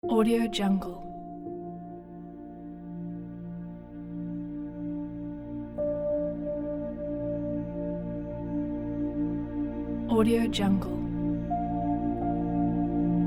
mysterious cinematic ambience

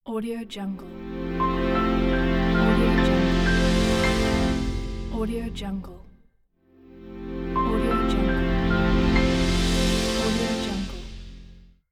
elegant logo reveal

